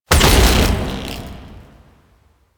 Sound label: Beam Fire Stop; Mission One; Condrix; Warframe; The New War